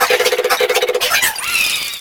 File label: Fan Chant; Mario Paint